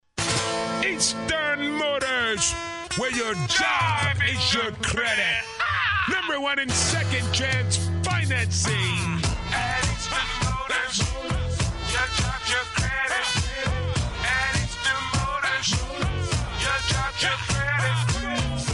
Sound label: Jingle; easterns open